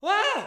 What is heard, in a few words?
Scream scary